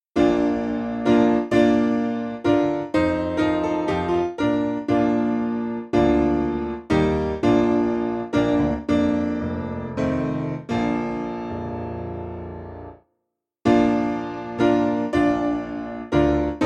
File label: Piano; Rathburn